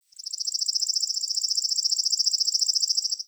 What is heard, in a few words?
Forest Open Glade Bird Close, Day; Ambience